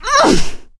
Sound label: melee swing